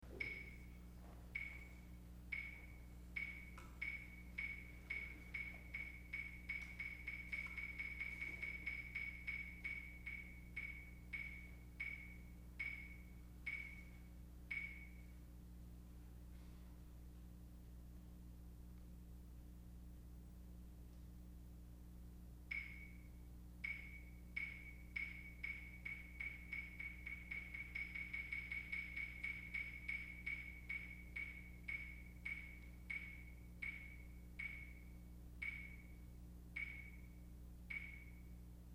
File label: Percussion Ensemble; Northwestern University's National High School Music Institute Percussion